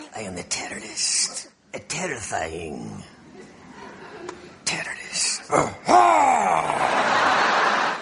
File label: Melody, RingTune